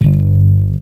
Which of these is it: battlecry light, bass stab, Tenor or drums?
bass stab